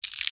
Cockroach being squashed

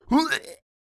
Guy throw up

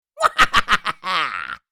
Mwahaha laugh little fantasy creature; fantasy friends

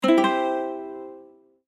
Medieval bard mandolin strum

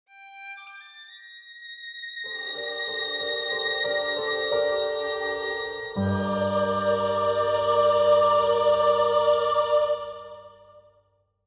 Game over you lose sad dramatic

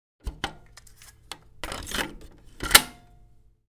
Cassette player tape insert and deck close

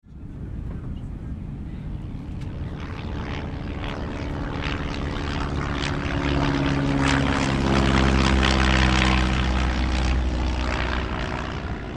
Light aircraft pass by and then fading away; airplane

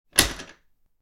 Steam lever machinery mechanical push turn on

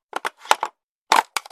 Cassette player tape insert and deck close

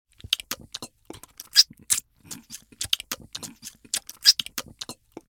Cartoon eating fast ravenously funny

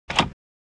Blind lock mechanism unlocking; blinds; gfxsounds

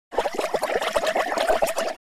Large air bubbles in water

